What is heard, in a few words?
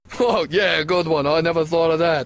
hahaa